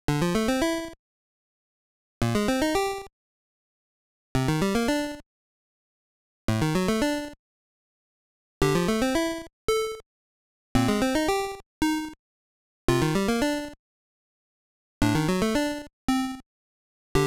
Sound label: string